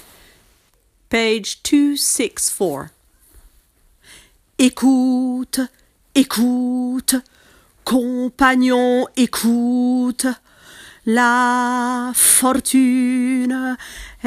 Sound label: tenor; Carmen